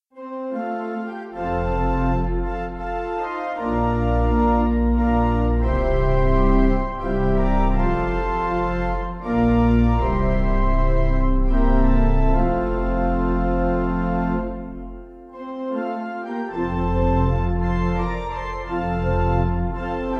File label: Pipe